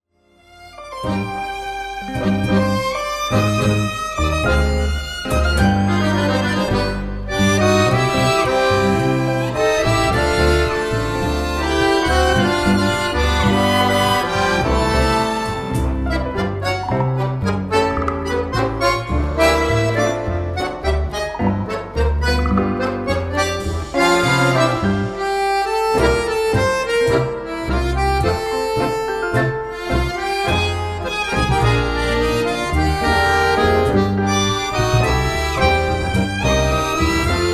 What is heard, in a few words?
elastic tango